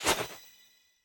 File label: Banana Throw; smite; raijin